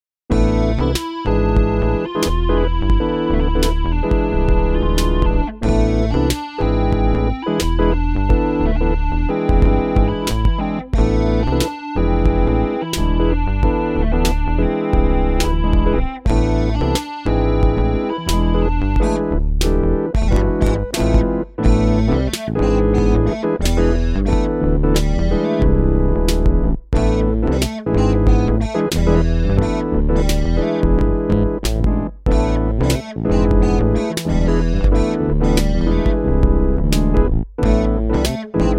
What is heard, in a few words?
Funk